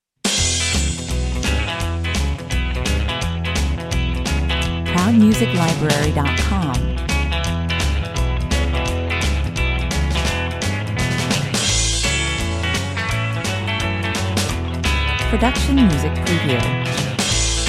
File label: rockabilly, 85 bpm; underscore version